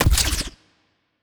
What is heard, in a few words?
evasion debuff projectile, weapons; Stellaris; biogenesis